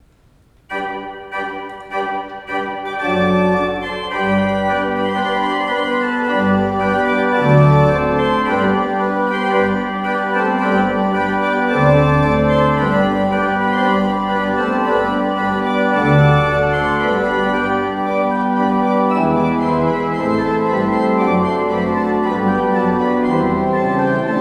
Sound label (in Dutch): Koraal en Toccata over Gloria in excelsis deo Orgel